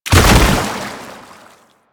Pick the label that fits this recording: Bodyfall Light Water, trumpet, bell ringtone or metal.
Bodyfall Light Water